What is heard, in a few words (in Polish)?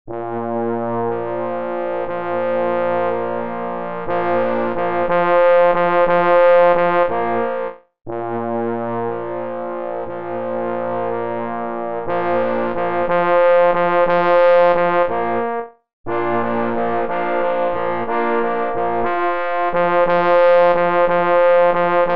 W droge mysliwi glos trabki plynie na 4 parforsy